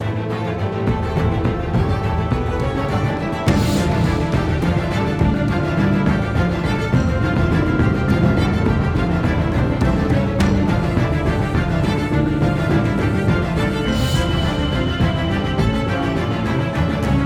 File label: Loop Action; the walking dead michonne